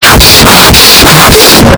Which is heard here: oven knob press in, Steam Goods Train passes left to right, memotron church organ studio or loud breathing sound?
loud breathing sound